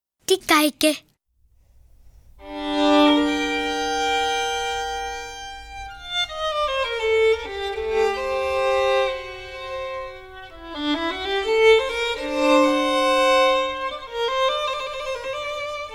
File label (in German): Violine - Geige; Bilder einer Ausstellung